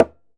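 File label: conga pop; E-MU Producer Series Vol. 7 – Old World Instruments; Drums&Percussion; Salsa Kit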